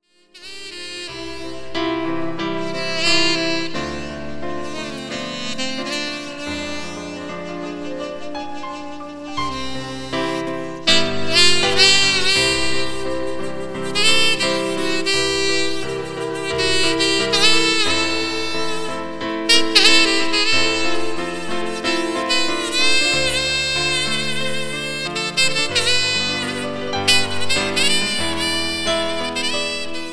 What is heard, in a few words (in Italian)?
sax